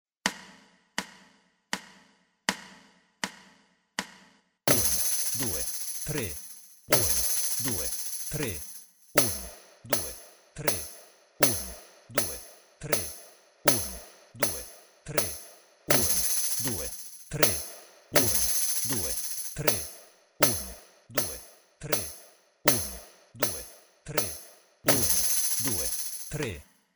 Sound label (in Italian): esercizio ritmo composto